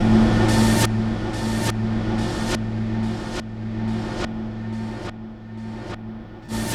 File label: noise echo, Drum N Bass; Sonic Foundry (Sony Creative Software); Knutrix Drum & Bass